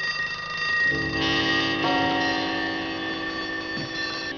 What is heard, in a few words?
alarm